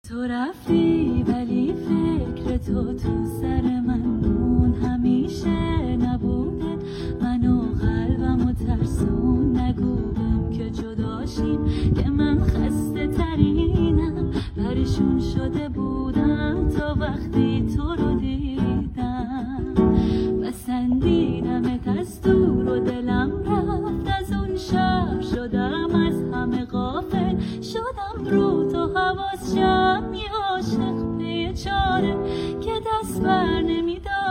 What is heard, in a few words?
guitar